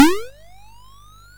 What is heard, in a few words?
sid MOS6581R4; Yoomp 64